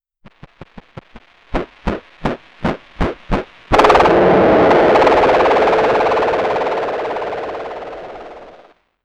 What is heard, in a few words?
a timeline arrow zooms pa